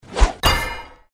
character hit; Journey To West

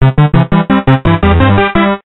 WIN; Deuces Wild

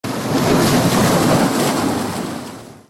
waves; Navy Girl; Attractions

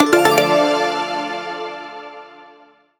multi line win; Sizzling 777; wazdan

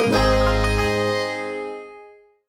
symbol common; Wild Guns

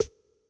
reels stop; Hot Party Deluxe; Wazdan

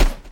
wheel stop; Fruit Fiesta; Wazdan

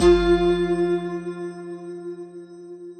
bom prize normal; Great Book Of Magic Deluxe; Wazdan

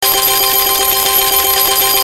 collect; Book Of Fortune

